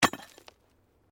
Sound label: Digging Pick Hard Earth; Various digging tools and processes; Construction